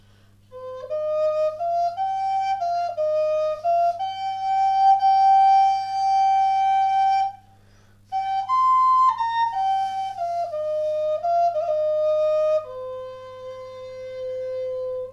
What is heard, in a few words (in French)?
Son double flute amerindienne do aigue chataignier